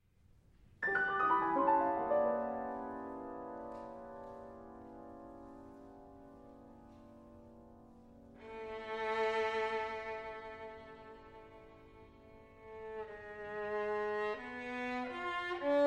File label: Violin Piano; Live concert performance; Fantasy for Violin & Piano